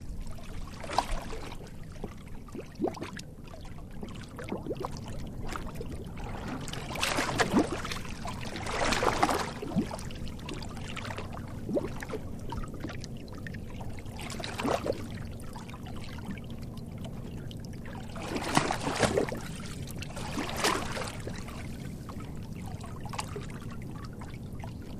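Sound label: Water Lap Light Movement, water laps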